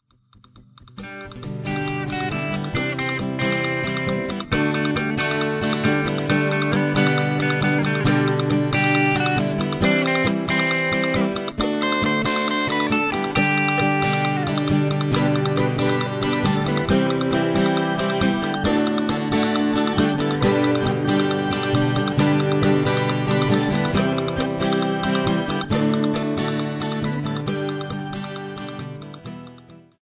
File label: instrumental